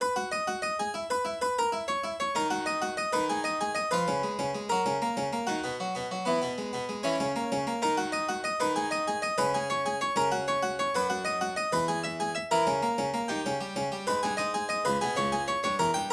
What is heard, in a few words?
C Major; Fortspinnung